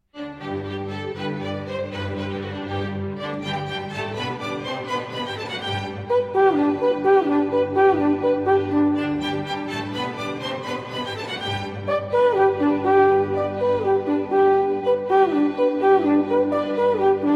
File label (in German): Alphorn; Mozart